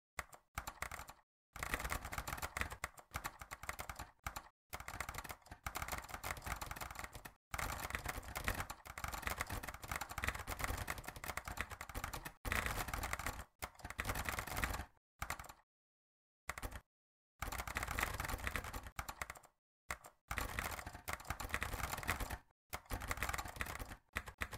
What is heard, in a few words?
typed with a Macintosh Keyboard; Martin Amis On Style